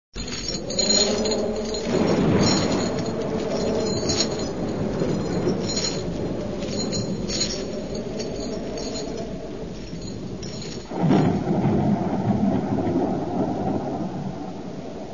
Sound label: Chains Rattling with background